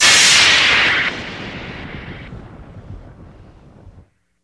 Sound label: Underwater Laser Fx